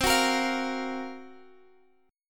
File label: Gb Major 7th Flat 5th C, chord